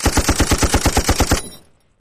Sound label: Machine Gun Rapid Burst; bursts